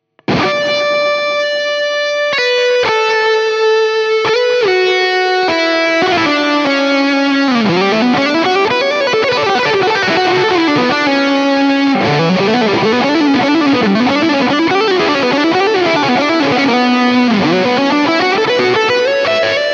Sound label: Solo space delay